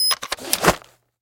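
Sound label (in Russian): установка карты памяти в фотоаппарат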